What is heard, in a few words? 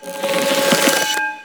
Choir Bow Charge; Warframe